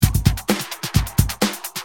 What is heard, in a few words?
Tight, Drums Perc; Industrial